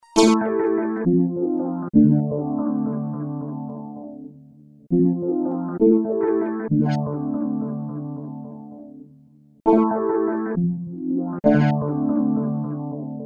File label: Synth filter; emu mo phatt